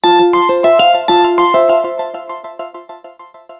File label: ring; fldigi; alert clips